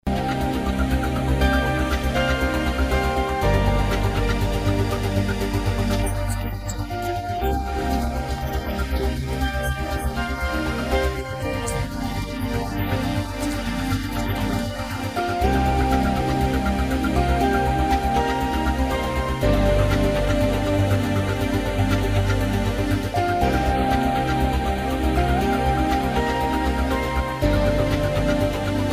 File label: rally 120bpm; f1